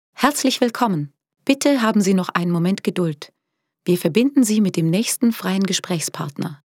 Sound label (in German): telefonpaket warteschleife